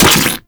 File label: Venom Tick; Warframe; Saryn